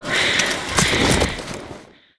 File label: PirateArcher DIE; Eft